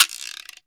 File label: GIRO LNG, GM PERCUSSION; AKAI S6000 CD-ROM - Volume 5